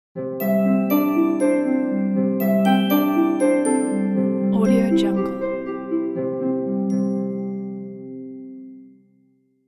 snow

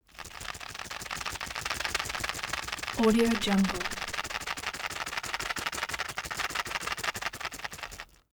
foley plastic drug blister shake

